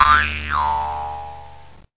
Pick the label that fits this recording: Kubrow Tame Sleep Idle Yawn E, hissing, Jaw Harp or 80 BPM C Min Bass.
Jaw Harp